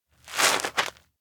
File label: steps outdoor step back; chiharu; anemoi